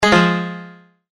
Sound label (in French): erreur